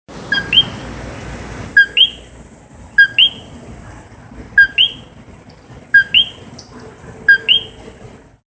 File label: coqui call puerto rico